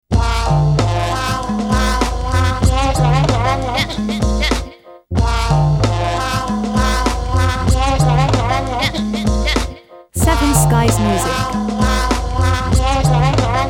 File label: Fun time loop